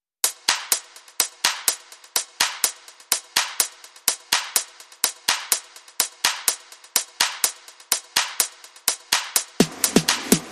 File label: Drum and Bass Only